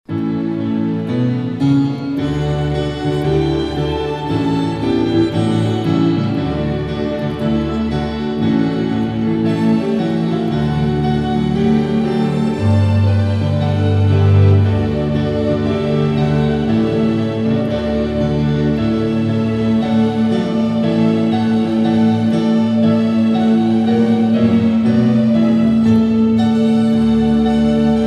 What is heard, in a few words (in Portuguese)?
Piano e Cordas; Nona sinfonia